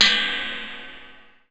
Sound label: ride